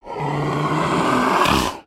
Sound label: Pets Tortoise Rage; creatures; Lego